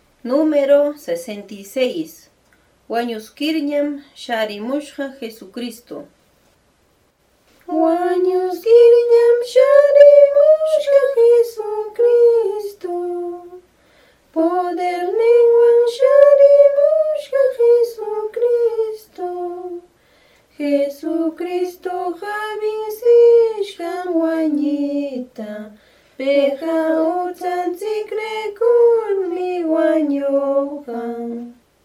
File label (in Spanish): cancion